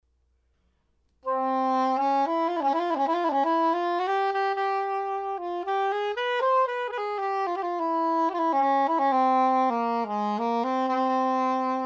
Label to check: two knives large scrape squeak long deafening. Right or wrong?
Wrong